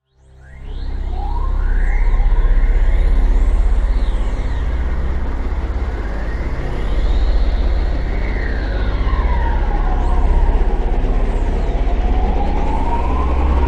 Synth, Stab, Drone, Horror, 5.1

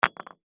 Brick Drop Cement; Cement Surface; Foley; Rocks; Materials; Blastwave

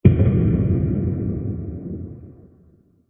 Large Metallic Explosion; Explosions

